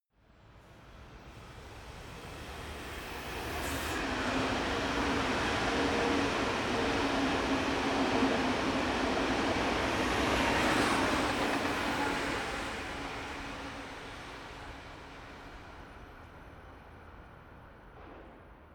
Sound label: train high speed train passing